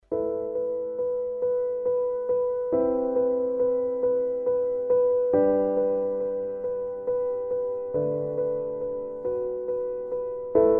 zvuk grusti

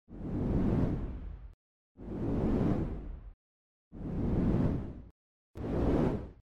zvuka perehodov kamera effekt

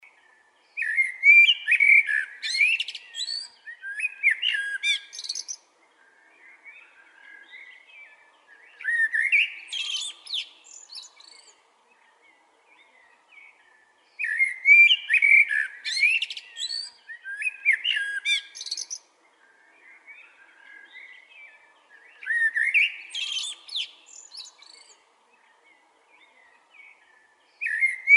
chernyi drozd

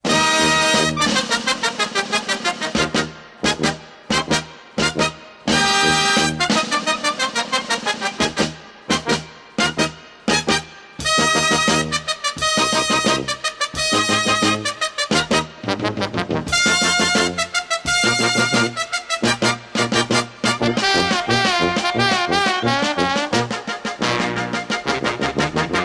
cyrkovaya muzyka; Звуки и музыка цирковая

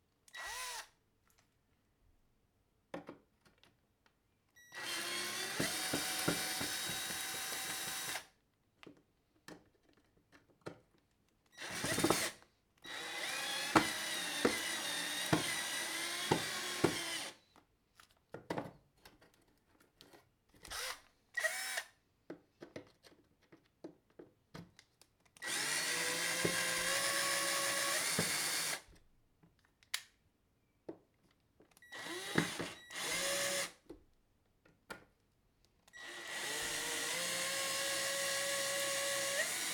shurupovert shurupy, zvuk shurupoverta

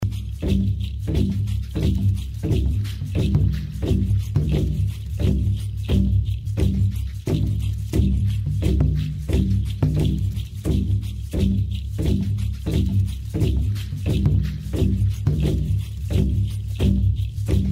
indeiskie barabany

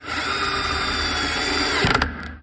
shurupovert, zvuk shurupoverta

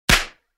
pistolet vystrel

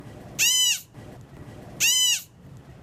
lenivec zov mamu

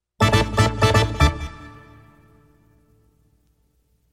zvuk zastavki

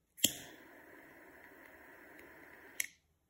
zvuk piezozajyg

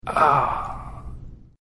Стон умирающего игрока; cs go

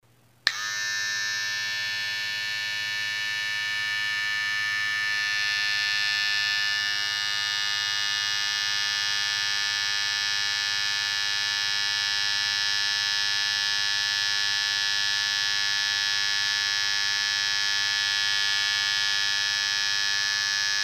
mashynka dlya strijki

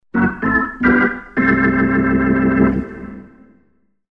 melodiya konca

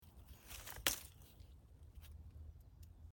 list sorvali

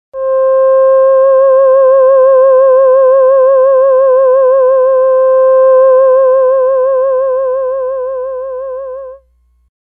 termenvoks nota do

